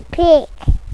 pig

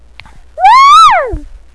elephant

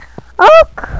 oink

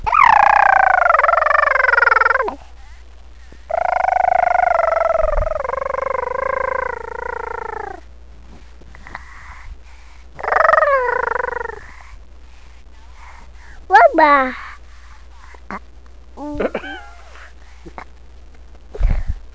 gurgle

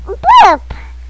burp

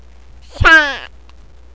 slide

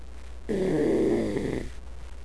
bear

